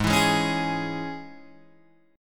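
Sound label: Ab Suspended 4th Sharp 5th chord, Guitar, Open G tuning; down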